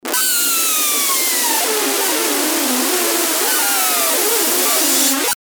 sweep strong distorted

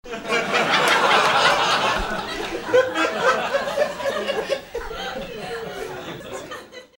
laugh long

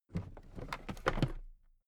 toolbox raise regular

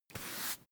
broom hand sweep

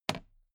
toolbox handle bounce regular

